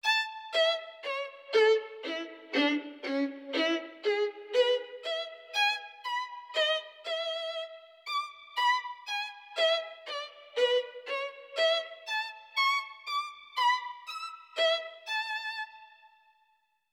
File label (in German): Allegro A Dur fur Streichquartett; 16 Takte